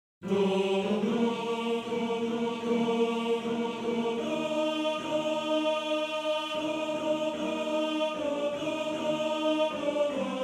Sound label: Tenor; Tenor 2